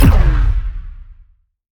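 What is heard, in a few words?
Sci weapon Shot Medium Blaster; Power Penetrator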